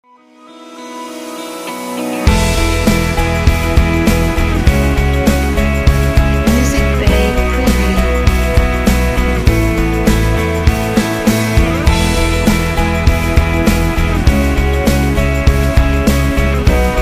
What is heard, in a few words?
Upbeat Energetic Uplifting Rock